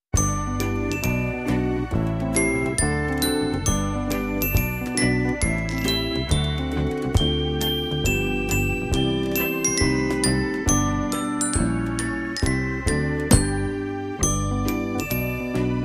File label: bgm